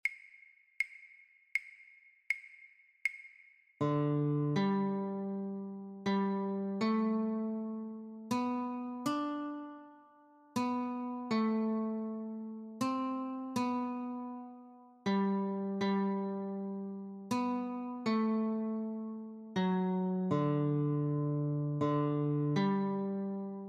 Notes, guitar; christmas

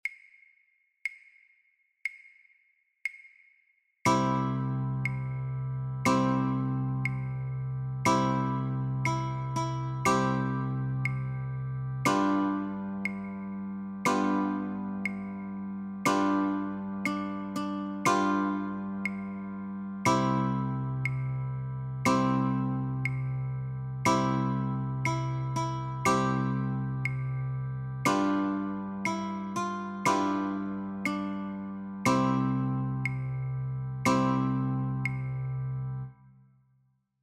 Notes, Chords and Claves, Guitar; Skip to my Lou

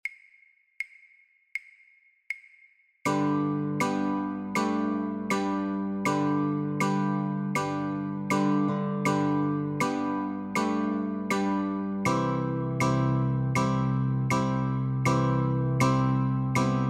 Jingle Bells Notes & Chords, guitar; christmas

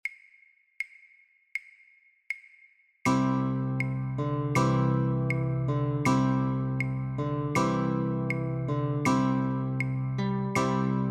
Notes & Chords, Guitar; christmas

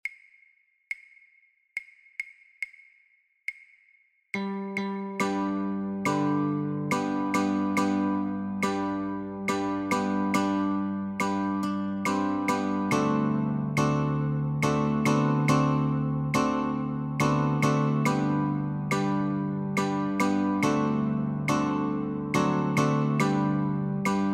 Notes and Chords, Guitar

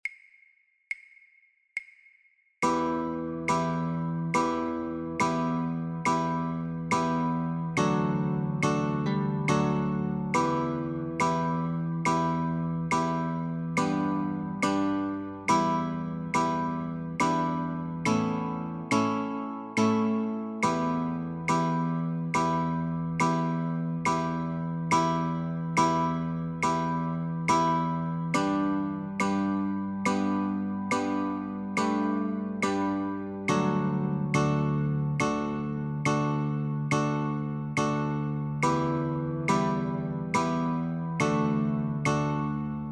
Notes and Chords, Guitar

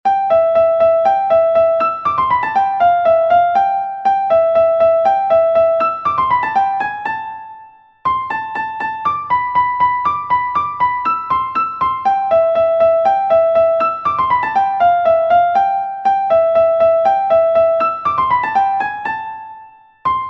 classical piano

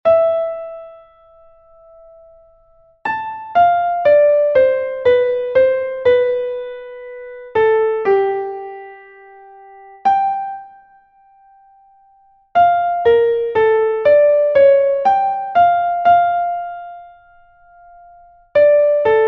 Air on a G String, classical piano

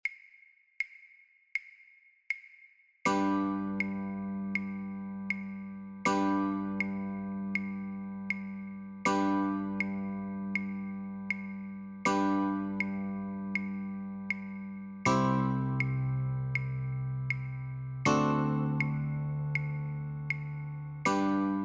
Joy to the World - Chords, guitar; christmas

